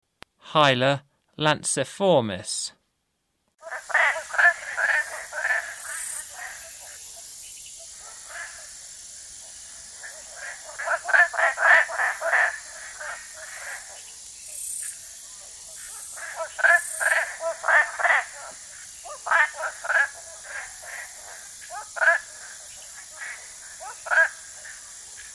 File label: Hyla lanciformis, Frogs of Ecuadorian Amazon; S. America